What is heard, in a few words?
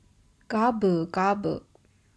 gabugabu, Drinking something quickly or biting something big; ガブガブ – gabu gabu; manga onomatopoeia sound effects